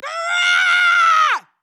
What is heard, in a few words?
female generic warcry